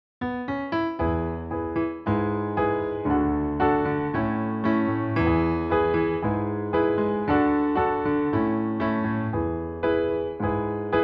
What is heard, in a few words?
accompaniment only; pulled